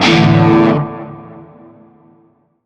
Shawzin Pent Maj Chord C; Warframe; Narmer

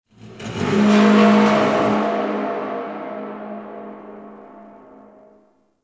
Stadium Metal Creaks Close; Ambience; Warframe; Nineteen Ninety Nine

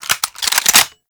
AR Clip In; Warframe; Weapons; Tenno

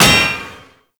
Proc Impact; Warframe; Mods

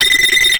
Strike Proj Shot; Infested Trapper; Warframe; Infested Lich; DJ Rom

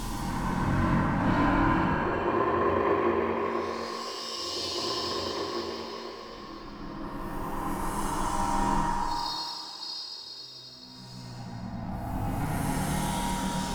Interior Bed Loop Medium, Ambience; Sentient Devourer

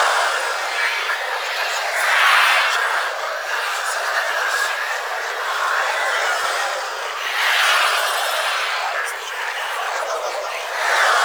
Alt Proj Loop; Warframe; Noctua; Weapons

